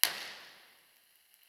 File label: IMP RESP Teatro di Cagliari